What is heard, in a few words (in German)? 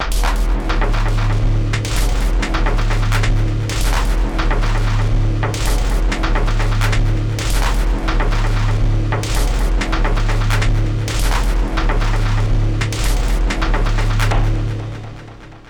Drum Wirr; Erica Synths Sample